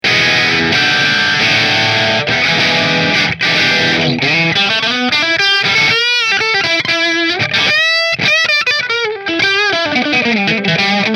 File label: Italia Position 5 Marshall